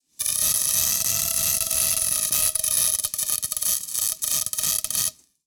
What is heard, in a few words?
Industry Tool Torch Weld